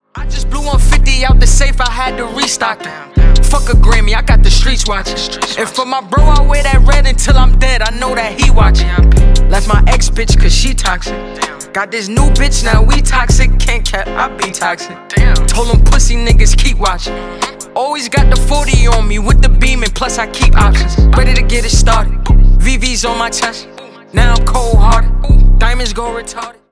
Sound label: beatbox freestyle